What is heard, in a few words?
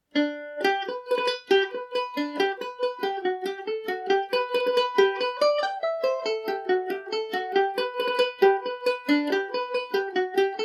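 G major, irish mandolin; The Ballintore Fancy; G&O #34 mandolin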